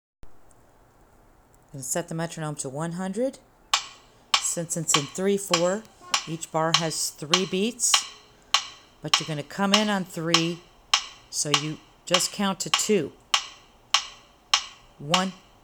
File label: Happy Birthday count in